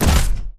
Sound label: Footstep Jog Close; Titanfall 2